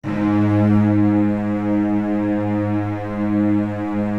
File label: CL SUS G#, Virtuoso Strings; ILIO; Kirk Hunter Virtuoso Strings VOL-2